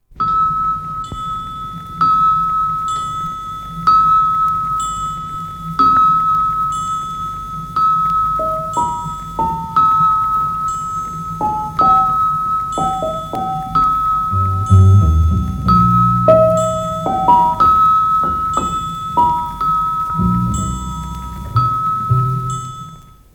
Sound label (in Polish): Sygnal SAR bez zapowiedzi